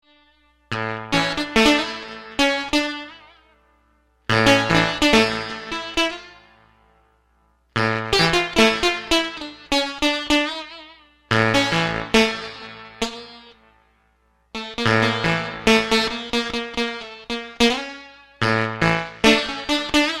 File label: jawari